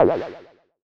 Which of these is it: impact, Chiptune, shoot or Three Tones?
shoot